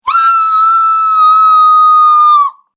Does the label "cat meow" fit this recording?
No